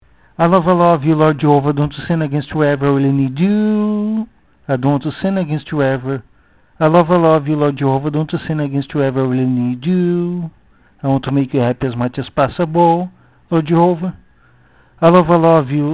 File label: just voice